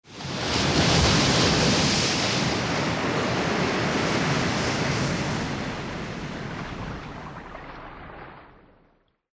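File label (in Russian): большая волна с камешками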